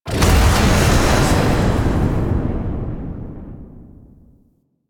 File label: Lotus Hand Fire; Warframe; Weapons; Duviri